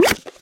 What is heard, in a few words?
click